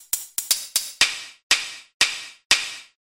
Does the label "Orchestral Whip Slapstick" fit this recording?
Yes